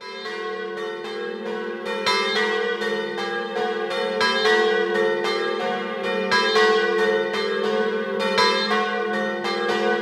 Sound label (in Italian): Doppio, campane; Sauris Di Sopra